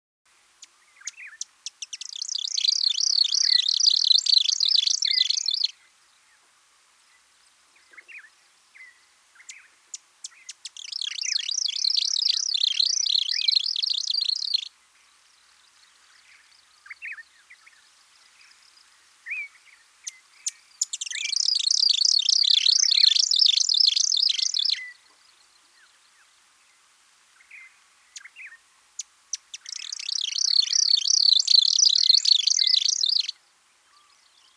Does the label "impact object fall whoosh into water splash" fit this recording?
No